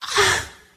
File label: ah orgasm; ooh ahh